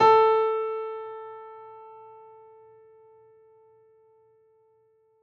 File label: spectrum piano a4; serie harmonica